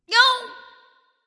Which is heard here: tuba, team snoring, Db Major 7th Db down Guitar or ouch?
ouch